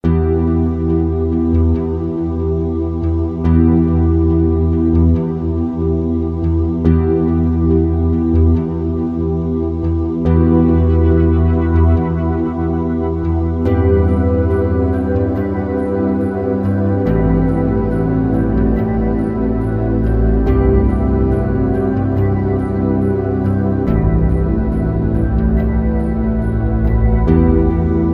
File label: sneaks beat; Cally's Trials